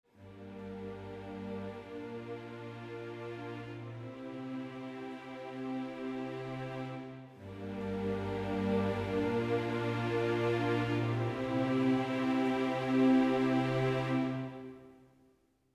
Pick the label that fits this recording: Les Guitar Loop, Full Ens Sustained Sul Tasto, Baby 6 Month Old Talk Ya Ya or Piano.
Full Ens Sustained Sul Tasto